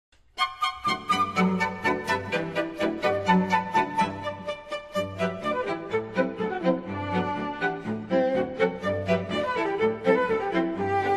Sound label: quartet; Christmas